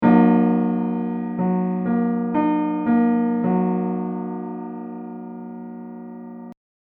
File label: Giant chord